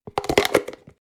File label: Plastic food container close